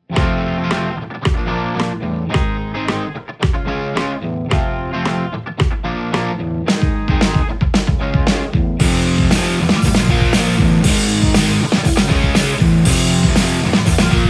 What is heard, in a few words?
Les Paul; igniteamps; theanvil v2 01